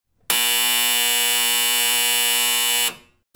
BUZZERS

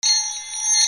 BELLS

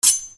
KITCHEN

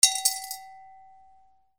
MONEY

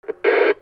PHONES

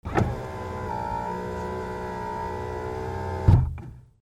CARS

